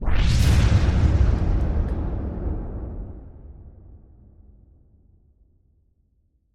anime explosion energy

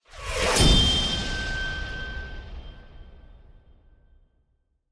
magical slice; soundscrate

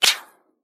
bullet impact ricochet

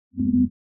mid space ship short fly by; soundscrate

